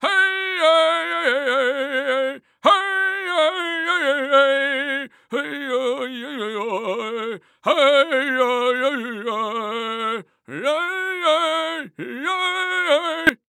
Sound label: Human Indian Chant Voice